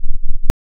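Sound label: ring modulation tri sin, silence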